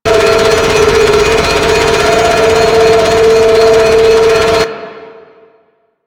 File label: Space Trench Run Power Cell Spin Loop; Warframe; Ambience; Space Battles